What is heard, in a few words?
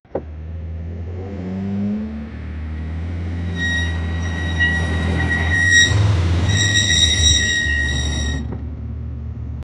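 heavy vehicle brake screech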